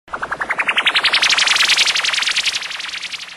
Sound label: alien beat